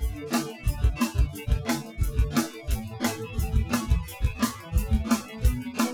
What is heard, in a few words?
Groove